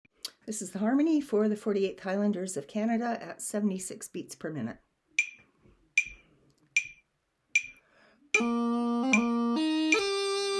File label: Pipes and Drums Harmony